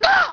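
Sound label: male scream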